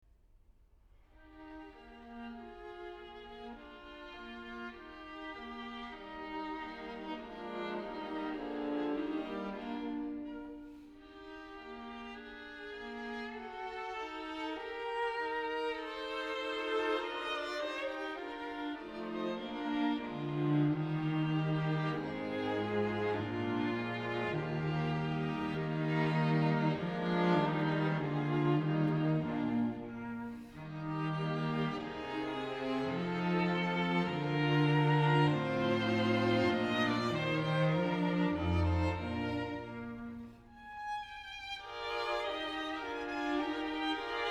String Quintet in C Minor, Andante cantabile con variazioni; Op. 104; Ludwig van Beethoven; Beethoven - String Quintets Op. 29 & 104